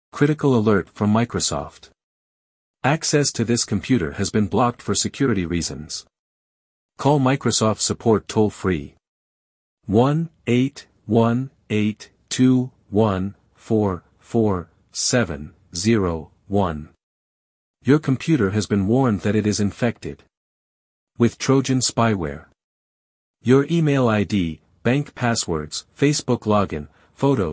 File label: Fm7 alert